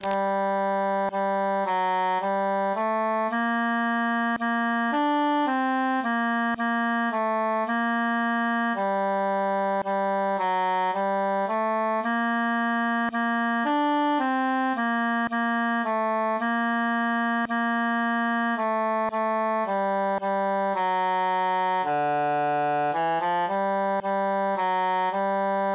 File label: cantus solo; belle qui